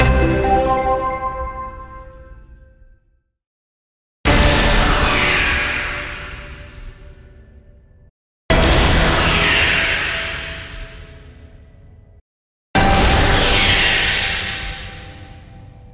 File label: Win Sounds; Action Ops Snow And Sable